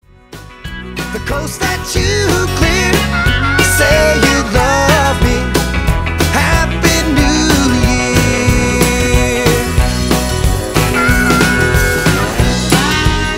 Happy New Year

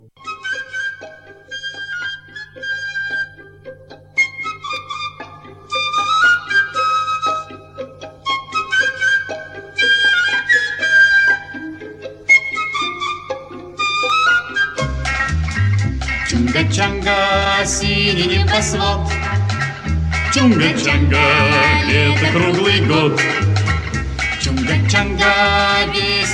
ringtone

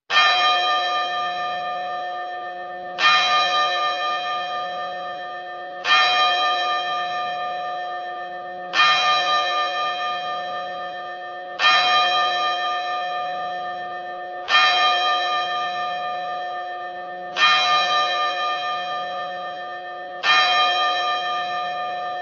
glass bell

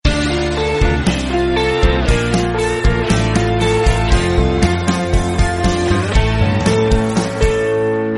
Micromax Tune

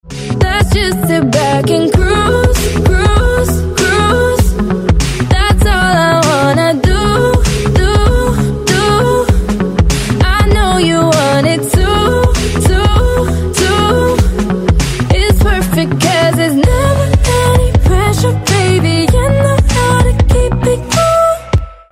ringtone

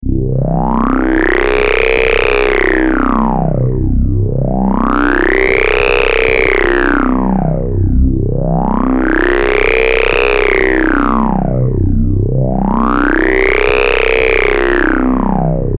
alarm sci-fi

